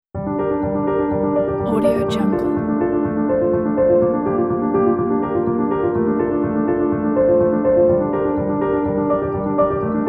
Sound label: modern classical documentary